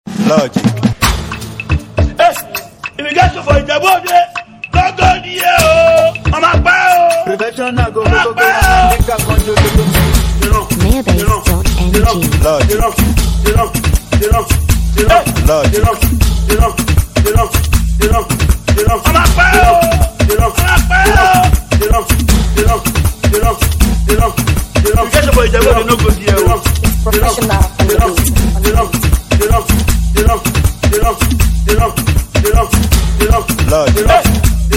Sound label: Beat Dey Run In Voice